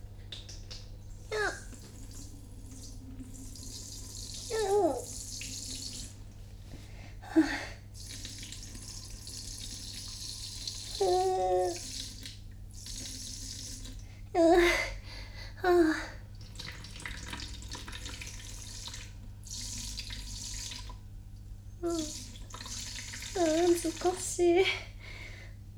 おしっこ 室内 洗面器 バイノーラル; おしっこのみと編集版

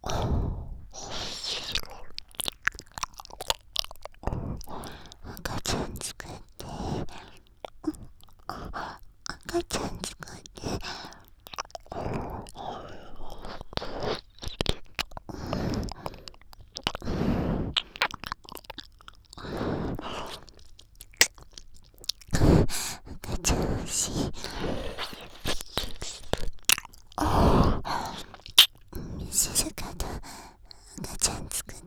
耳舐め喘ぎ; 赤ちゃん作ろ♪; 静華

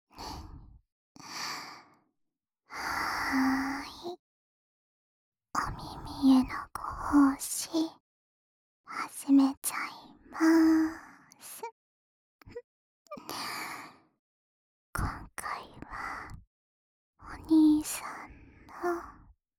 お耳ふーふー（右耳）

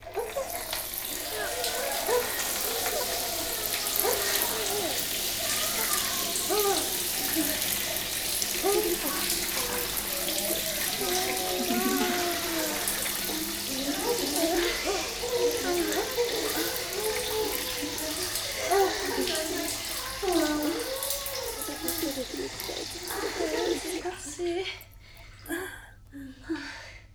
お風呂場 同時 音声分割

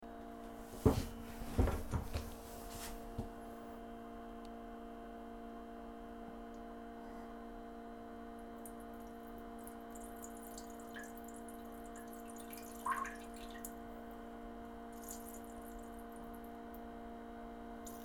脱ぐ、座る、おしっこ、しょろしょろ音

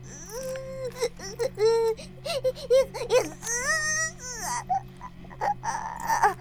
バイブ放置; イクたびにわけて

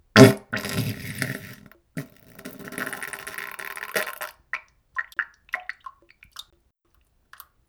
下痢からなんべんになりかけ ： ぶっぶりゅりゅりゅりゅりゅりゅ ぶすうーぅぅぅ ぴぱ ももも （ぽちゃ ちゃ）

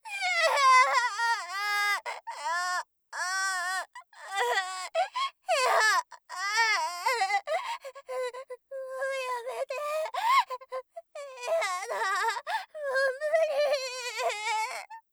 情けない声

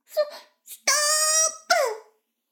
シコシコ音声, ASMR; 逆時間停止されて

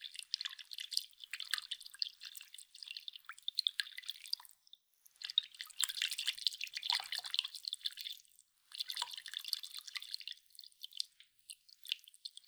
くちゅ, 水音効果音

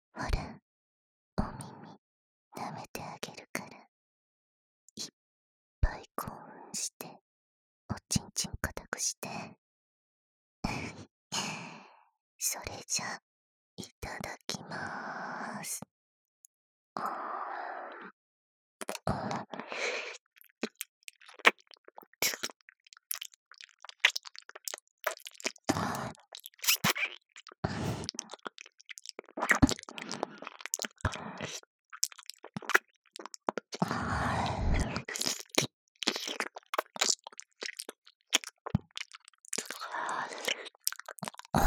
目を閉じて耳舐め; マーキング責め調教

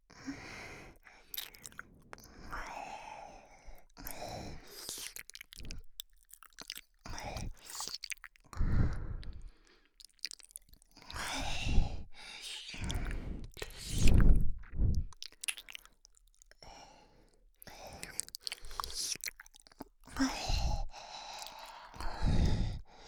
耳舐め（ハーレム耳舐め）, ASMR; フィニッシュタイム1919耳舐め

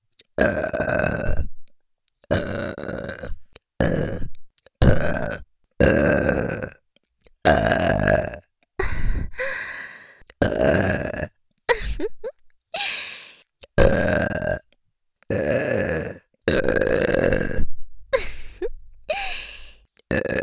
ゲップのみ

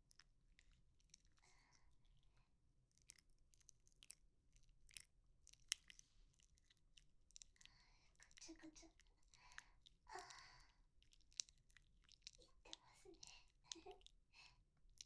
耳元くちゅ音 ループ用 バイノーラル

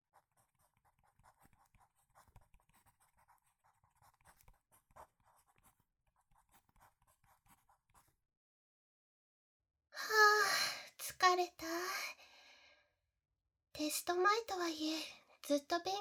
耳舐め手コキ; たっくん; テスト5日前; MP09たっくん

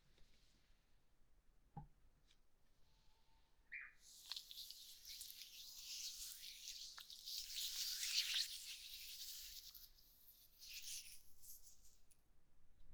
低粘度オイル 春の朝の環境音, ASMR

